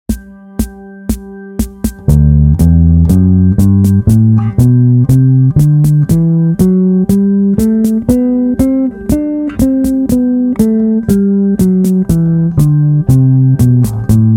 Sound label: Mixolydian Mode, bass; flatted seventh; In the key of G; blues; dominant scale; As you can hear, that flatted seventh is an important part of the Mixolydian sound